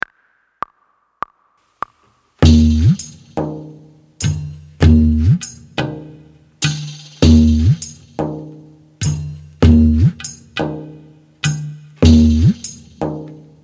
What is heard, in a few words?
dotted rhythm slide practice with tabla